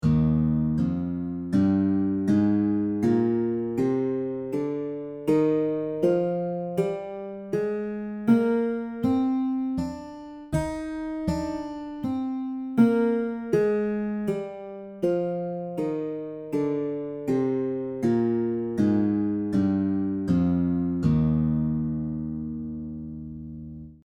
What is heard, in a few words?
Major Tetrachord